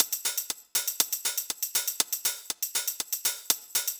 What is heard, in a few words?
House Drum OnlyHH Reverb